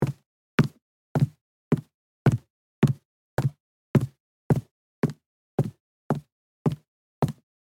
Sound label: High heels fast walking on carpet